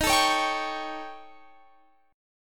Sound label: A 7th Flat 5th Eb